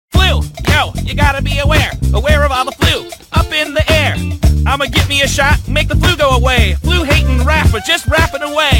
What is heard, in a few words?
rap